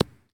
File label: Baseball Bounce Grass